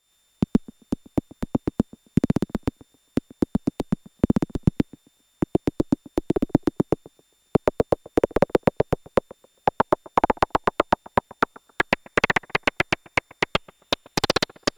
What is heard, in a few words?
Analog Solutions Ample Percussion modulated Sequence analog Echo